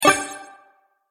Sparkle Strike, magic sound effects

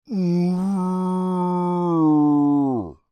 Creature Moan Long, Human; Groaning

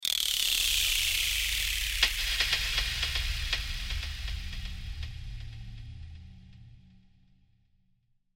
Horror Swell Echo Swell Descend; Scary Tones; Blastwave

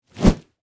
Whip Whoosh Rope, Heavy; Foley; Sound Effects:Objects; BlastWave

